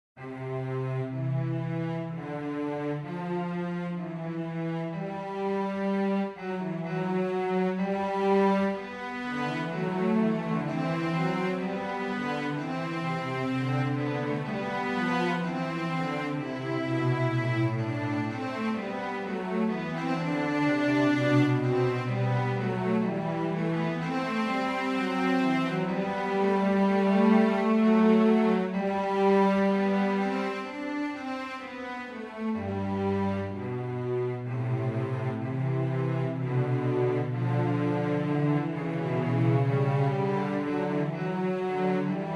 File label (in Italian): Fuga in Do maggiore